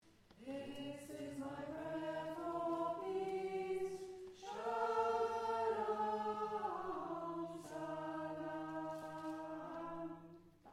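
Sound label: soprano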